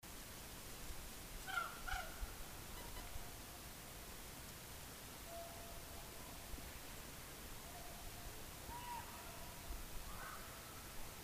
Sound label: Raven sounds